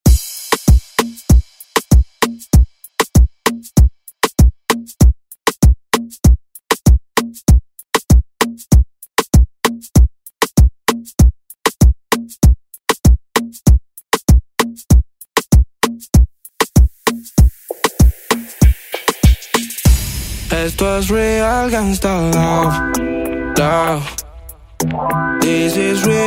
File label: ReDrum; DJ Mhark